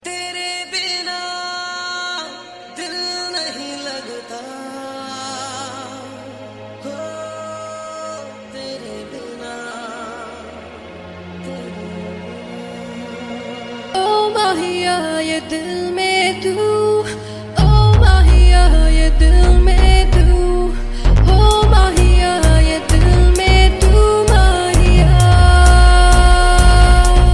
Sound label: Techno Mix